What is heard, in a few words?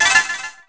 Shell Ring; Finding Nemo; XBOX